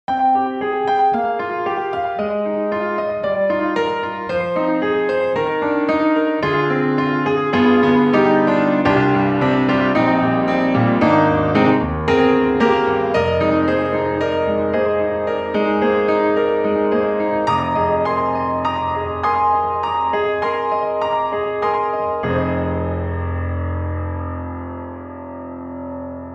Grand Rhapsody Piano Echo Grand; Waves

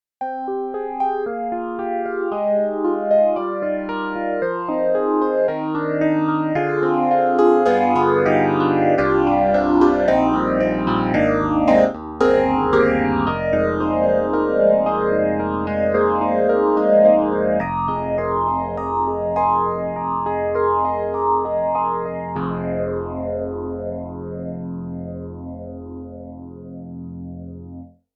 Yamaha CP80, Phaser, Slow Phaze; Steinberg; The Grand 3

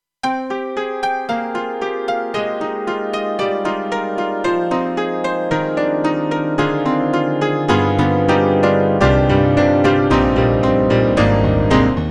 KORG M3 XPanded Saloon Tack Piano

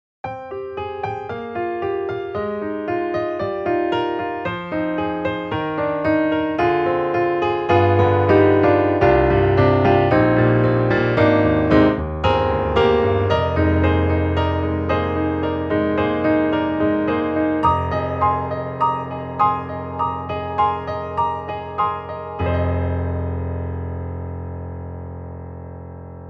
Studio Grand, Close Hard; XLN Audio; Addictive Keys

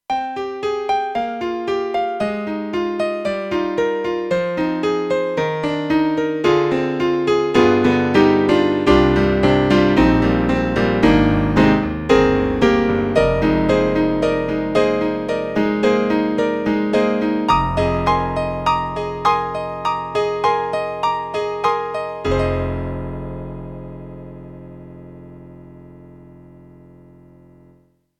YAMAHA MU5 Grand Pno

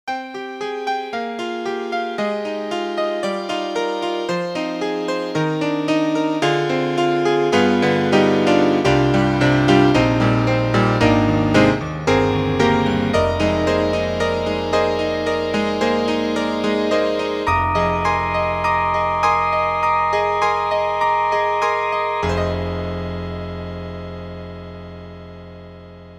Electric Grand Piano; Apple; Quicktime Built-in

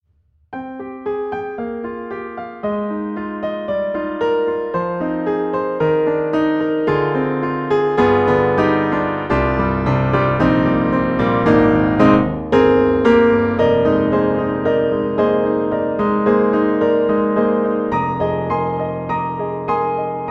Pianoteq D4 Classical BA, piano; MODARTT S.A.S.

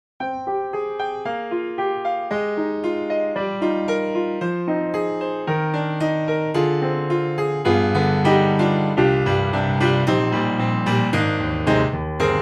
Yamaha, piano; EastWest; Quantum Leap Pianos

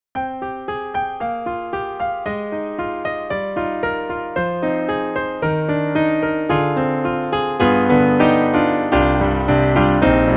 Roland SRX-06 (XV-5050); Joyo Classics

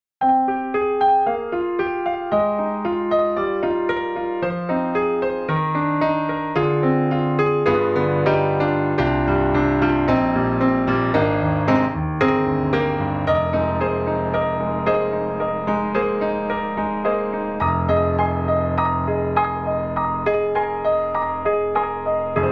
Kinda Squashed Grand

